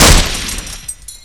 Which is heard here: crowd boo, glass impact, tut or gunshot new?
gunshot new